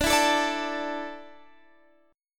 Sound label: D Suspended 2nd D Guitar Standard; Dsus2; strummed; x,17,14,14,15,17; down; capo=3